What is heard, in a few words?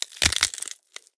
Gore Bone Break Snap; Just Gore AddOn; soundbits